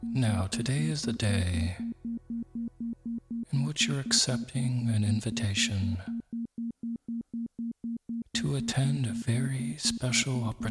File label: Inner Guide With Isochronic Tones